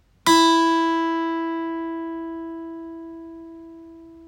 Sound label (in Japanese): 1弦